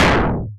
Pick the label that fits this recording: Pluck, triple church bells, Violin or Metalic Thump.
Metalic Thump